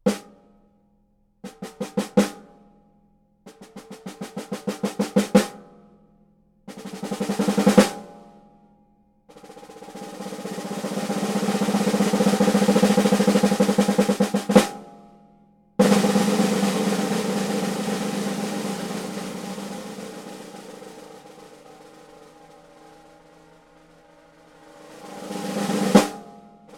Birdseye 4.5x14

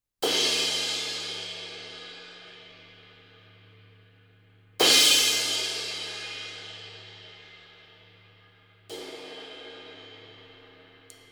22 Sabian Artisan

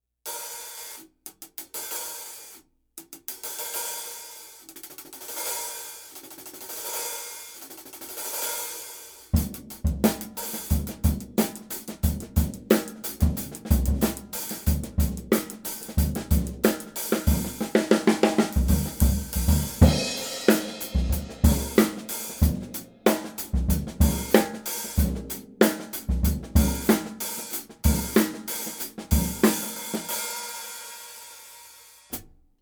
15 zild hh

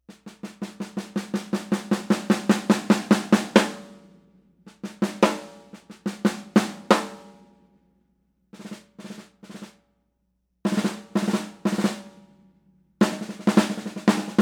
Gretsch USA 6.5x14 Satin; Millennium

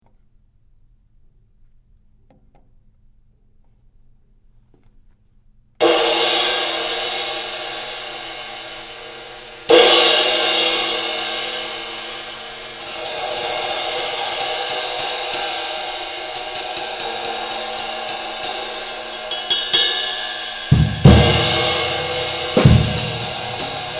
A. Zildjian 22 Med NOS Ride; nos zildjian medium

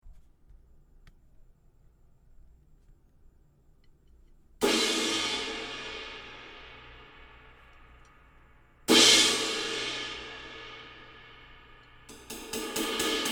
Crash; Istanbul; Agop Epoch 20; 1560 gr

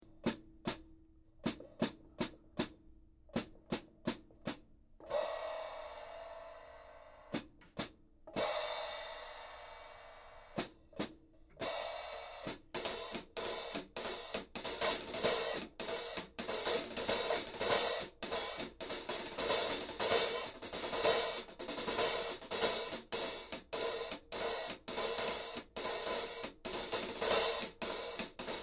Old A HH

